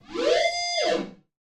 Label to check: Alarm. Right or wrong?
Right